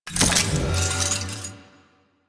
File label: Shotgun Reload; Weapons; DE; Warframe; Tenno; Pod Shotgun